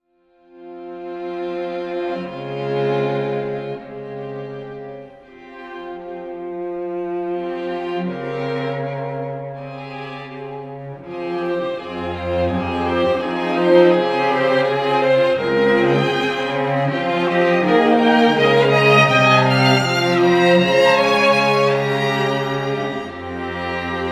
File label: Strings